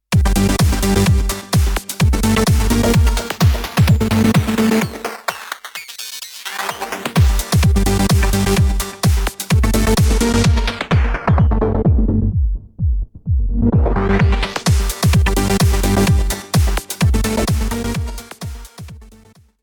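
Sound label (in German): Hörprobe djm 350; Crush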